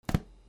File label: PAPER